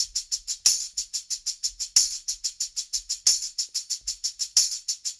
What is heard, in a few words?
Call And Response Tambourine, 70s Analog Funk; Sonic Foundry (Sony Creative Software); What it Is!